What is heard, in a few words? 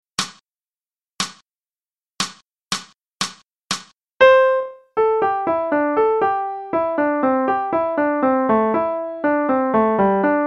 Transcribing Rhythms; non-swinging